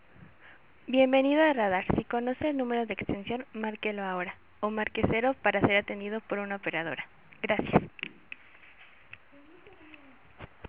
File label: Radar